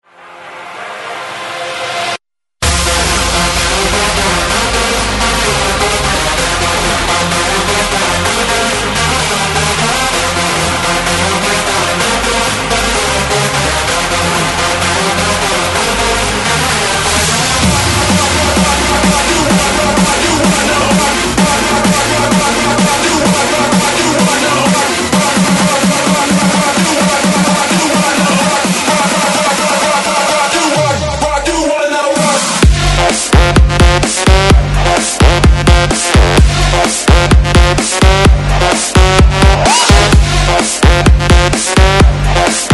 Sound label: 128 BREAKMIX